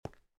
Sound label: stone step; vectozavr-shooter